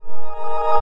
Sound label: ALERT